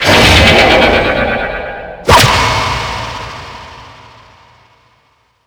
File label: retro kill; slender; isabelle